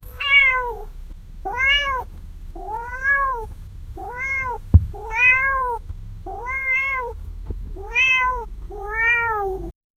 sound of taking cat with, cat

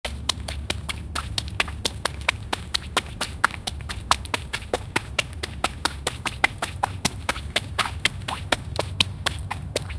year old kid running, wet concrete; 10 year old kid running

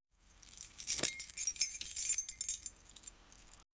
picking key and adding it; objects

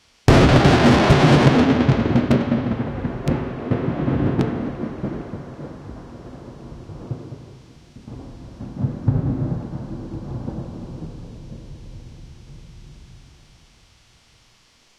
steady dynamic punchy, electricity; steady dynamic punchy thu

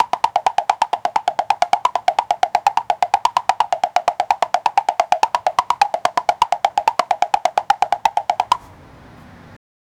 rio street percussion

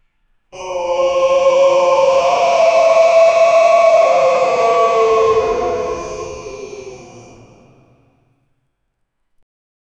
sounds of hell yelling

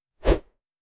quick whoosh sound effect; motion

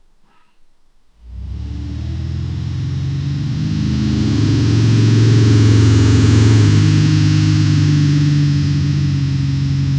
deep smooth rumble of a, low rpm

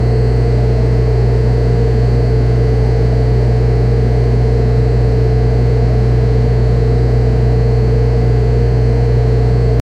household

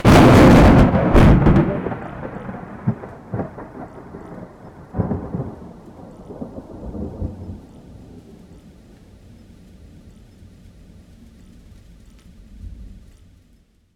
thunder crash

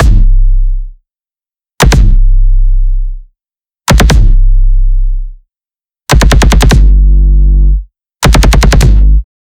techno

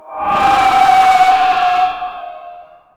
unnatural scream of a cur, spectral

